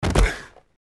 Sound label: hit; csgo